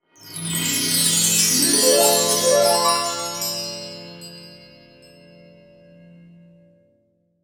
ui reward; devoid of shadows

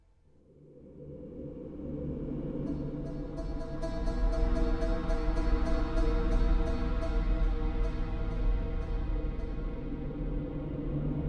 Dulcimer; Nancy Drew: Curse of Blackmoor Manor

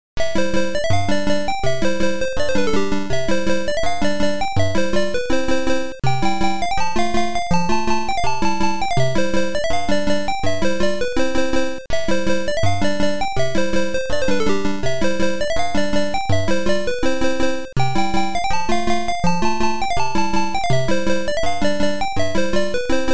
BGM Rising Surface; Oretachi Game Center Zoku: Pooyan

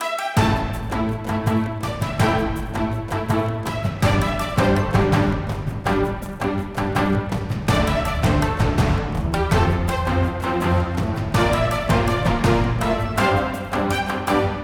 music forest

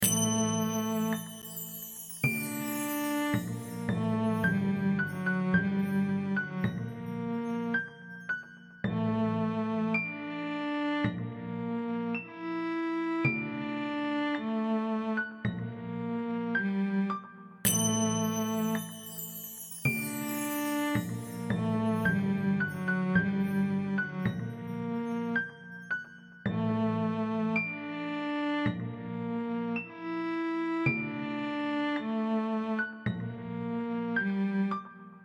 Orchestra; Northern Blockade

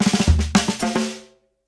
Win sound; Hyperfleet

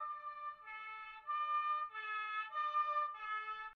Amb Generic

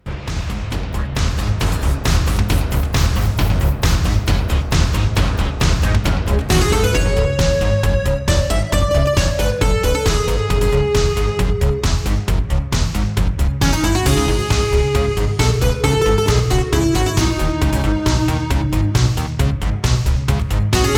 Lava Caves ~ Depths; Kelve; Rune Factory 5

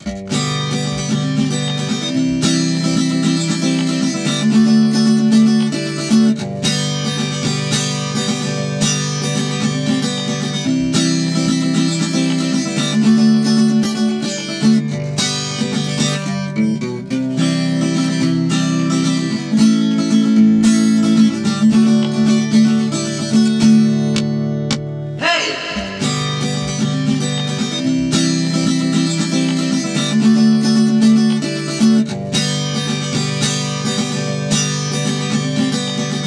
CAMPFIRE; We Love Katamari Reroll + Royal Reverie

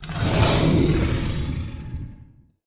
Growl; Hero in the Ocean 2

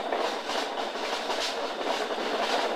chariot wheel meca Mid; Puddle; Puddle Plus

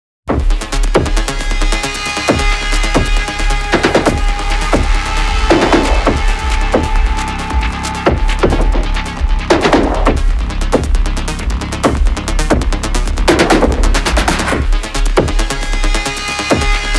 Combat Loop 135bpm; Combat3v2

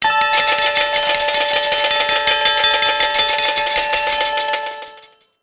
jackpot price

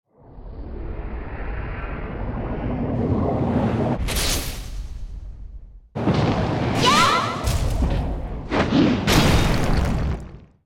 Appear

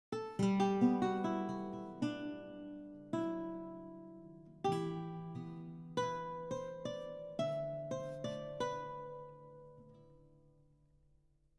baroque guitar

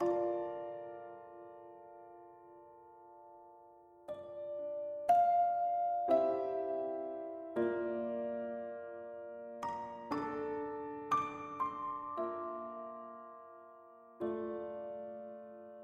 music sad; Sentry Knight Tactics

